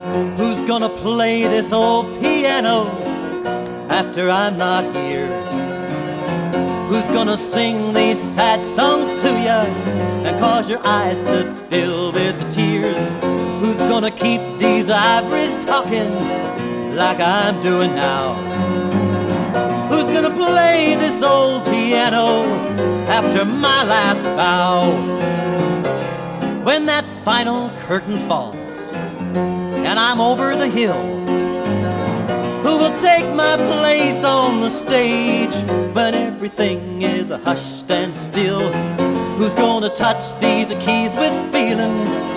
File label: Piano